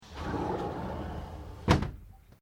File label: Window Sliding Large Aluminium Close